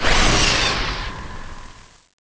ドリルパンチ空振り; super otousan

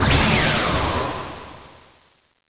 発射; Ｖスラッシュ発射; super otousan

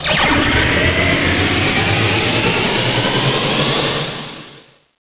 溜め; Ｖスラッシュ溜め; super otousan

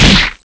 掴み; パワーボム掴み; super otousan

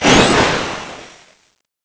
ジャンプ; フェザーマシンガンジャンプ; super otousan

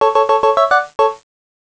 携帯呼出; super otousan

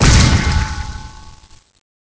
着地; フロッガプレス着地; super otousan

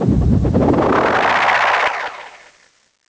回転; フェザーマシンガン回転; super otousan

